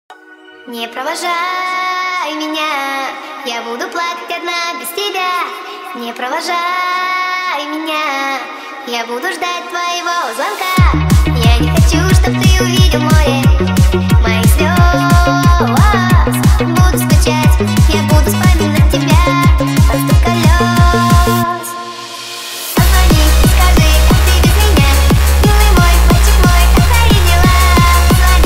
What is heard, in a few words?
hardstyle remix